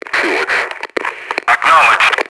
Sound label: merc noise; enemy; Max Payne